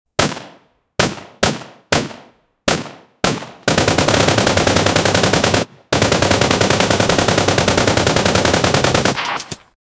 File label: ak 74 gun fire sound, automatic rifle